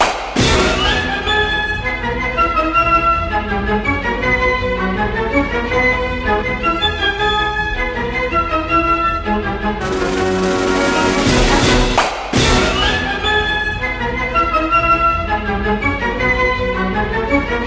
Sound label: Champagne Galop